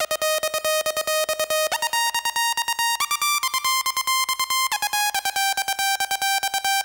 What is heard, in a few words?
Tranc, Techno Melody; TechnoMusicMaker